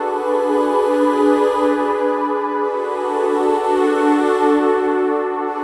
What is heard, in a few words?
String Loop